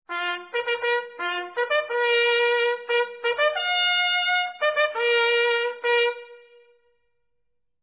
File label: School, bugle calls